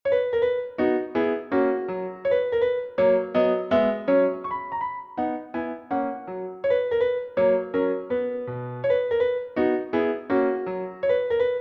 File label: Minuets for the Piano